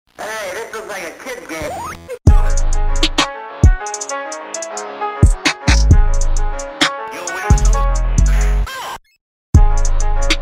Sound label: instrumental